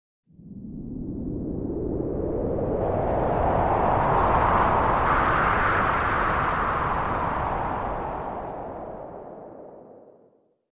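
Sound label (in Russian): pogoda svist vetra zavyvanie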